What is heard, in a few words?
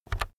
keyboard external key press very fast soft